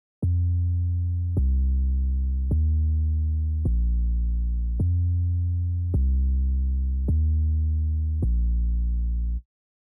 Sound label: Bass, 105BPM F; Monosounds; Nomde; PANDORA BOX!